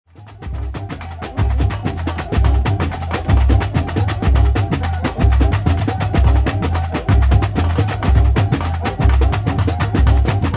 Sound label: aerobic samba; musica da zumba